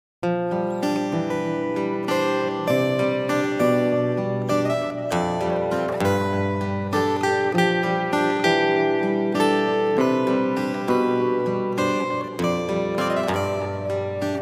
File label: Flat Minor Loop; Flat Miner